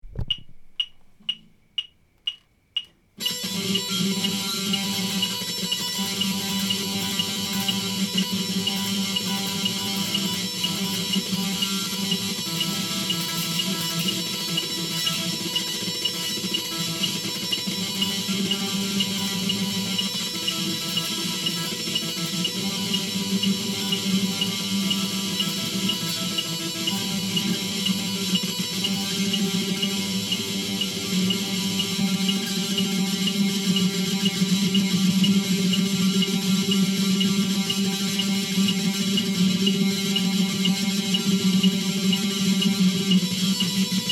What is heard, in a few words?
rock delightfully messy trem picking with a metronome; weeklybeats-2012